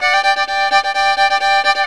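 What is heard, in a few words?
G FIDDLE GROOVE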